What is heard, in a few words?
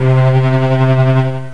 tremolo strings, strings & orchestra; gmplay